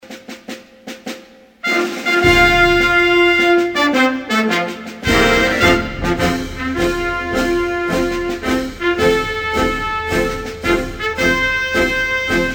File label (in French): Erythree, Hymnes nationaux